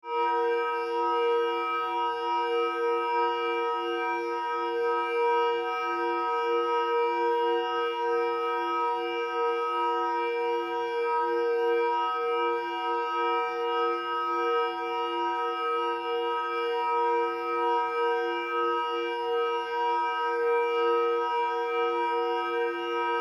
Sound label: Triangle A3